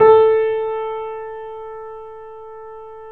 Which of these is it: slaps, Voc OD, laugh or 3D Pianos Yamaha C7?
3D Pianos Yamaha C7